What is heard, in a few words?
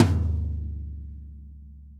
Low Tom; osu